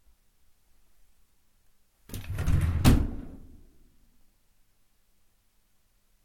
fast open top drawer, Bedroom, absorption of curtains, carpet and bed.; Description - Quick Open, pulled fast, grabs, slams, wooden d